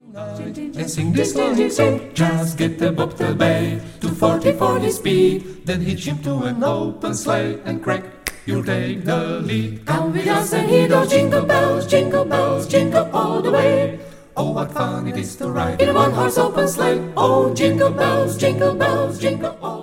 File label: jingle